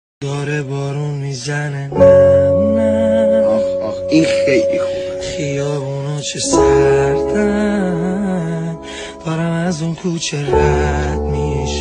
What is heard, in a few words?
Piano